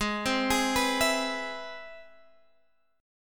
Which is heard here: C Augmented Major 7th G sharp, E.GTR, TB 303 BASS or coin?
C Augmented Major 7th G sharp